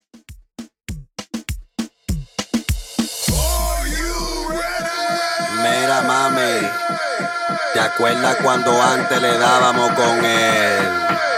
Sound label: Reggaeton Twerk 100 BPM